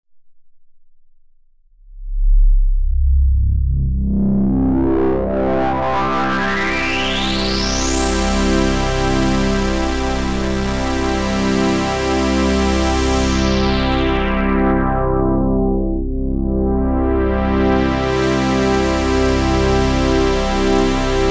smooth; fabfilter volcano2

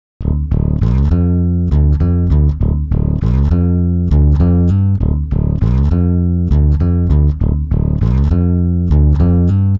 pitchshift bass; prosoniq timefactory dirac

